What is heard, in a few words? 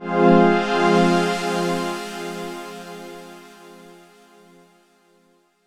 F minor, Chords; Electronisounds